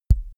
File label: microphone pop on soft; objekte; elektronik